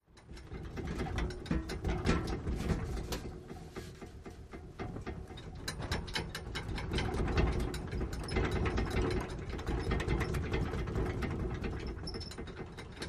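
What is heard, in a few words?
Metal Vibration Movement Dual, hits & rattles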